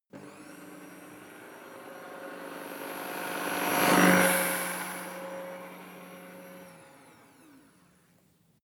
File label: fly by humming drone passing